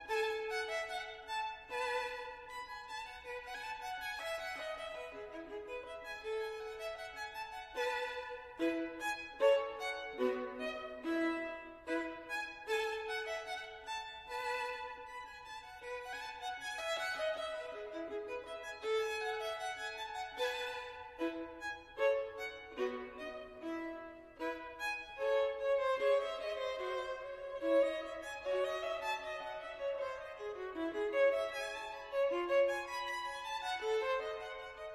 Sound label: Gavotte en rondeau, Violin